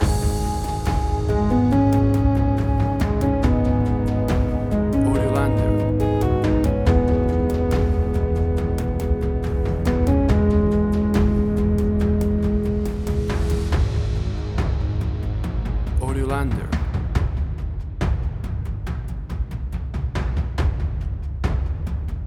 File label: Atmospheric Slow Tension